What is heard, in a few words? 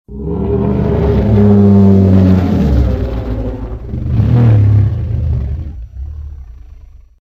T-rex - Growl; RadwinIsland